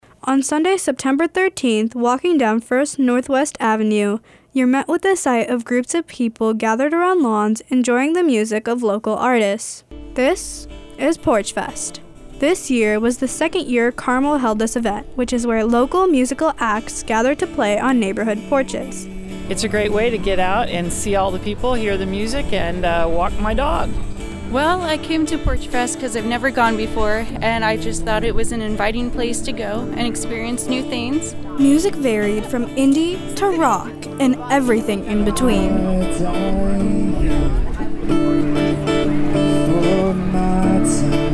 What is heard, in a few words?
BEAT